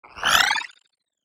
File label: Futuristic whoosh transition